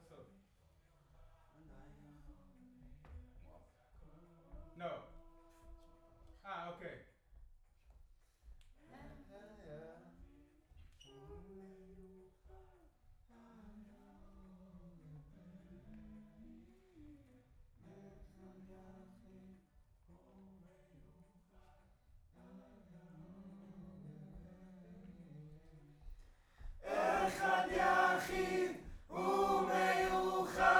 Choir Far

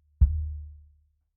Sub

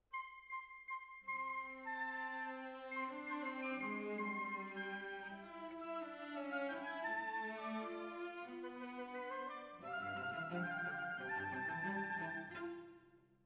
Decca, Jazz for piano

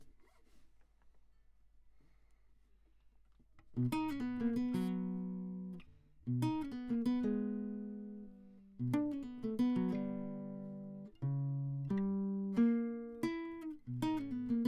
Classic Guitar

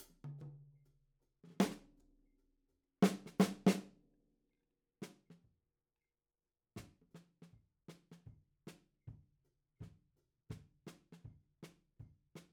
Ragtime

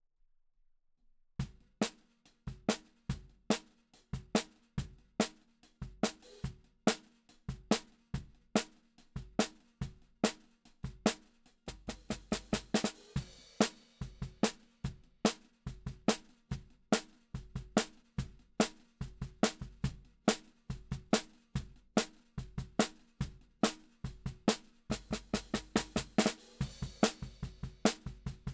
Snare Bot

